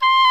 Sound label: Oboe, Orchestral Winds; Roland LCDP04 Orchestral Winds; WND Oboe f